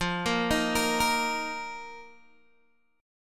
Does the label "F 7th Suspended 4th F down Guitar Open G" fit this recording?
Yes